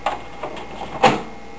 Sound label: DISK INS, computer